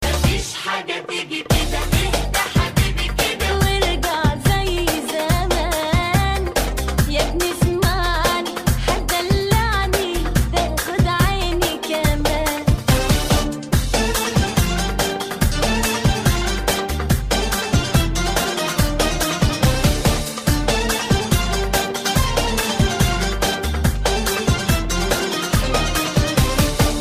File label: arabic remix arabics